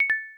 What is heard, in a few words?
ding down